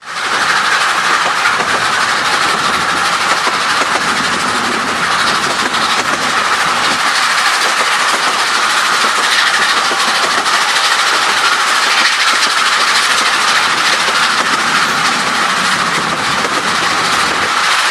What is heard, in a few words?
exhaust fast, narrowgauge